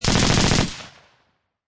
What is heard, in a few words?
Machine Gun Heckler and Koch MP540 Long Burst; H+K Mp540